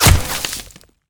ground hit, damage; DCS

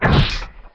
jettison, aircraft; DCS

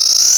tunneler worm prejump

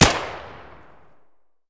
p226 fire distant; Escape from Tarkov

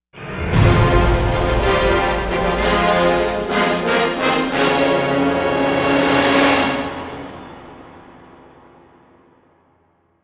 multiplayer lose

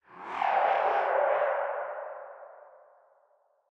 ship engines; World Of Warships

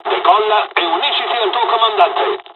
takeoff; dialogs italian

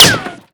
Projectile Sounds; Red Orchestra Ostfront 41-45

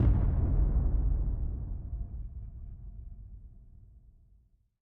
music interactive; World of Tanks

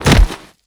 grunt impact heavy

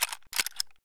kedr slider jam; Escape from Tarkov

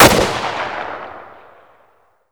medium far distant, weapons; Modern Warfare

